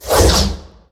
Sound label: imp warp out